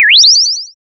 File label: short rising, haptic